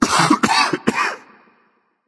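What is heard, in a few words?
nonverbal clear throat; smite; voicepack; merlin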